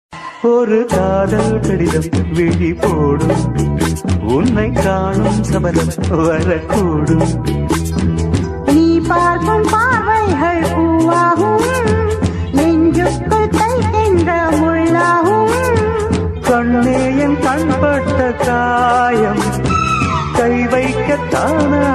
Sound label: tamil